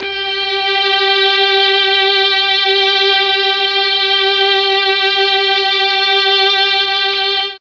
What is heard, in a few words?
G2 3 violins, mellotron MKII